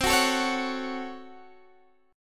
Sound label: A 6th C, Chord; A6/C strummed